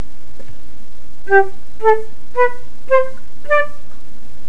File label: Lively tonguing, flute